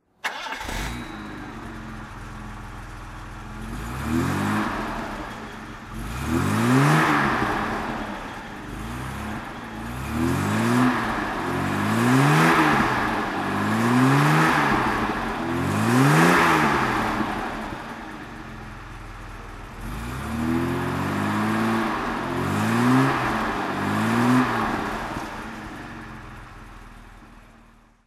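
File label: jaguar xj6 coupe throttle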